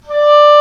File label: Oboe mf D4; Digital Sound Factory Virtuoso